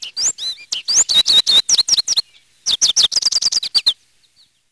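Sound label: goldfinch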